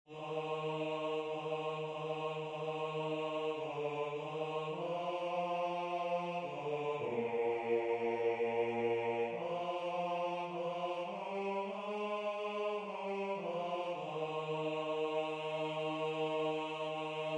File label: SOLO; Entzun Abesbatza; Elurra Mara Mara; S. Jaroff / G. Aulestia